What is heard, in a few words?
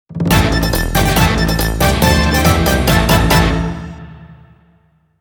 Start Jingle; Zakuzaku Shichifukujin; Shodai No Start Jingle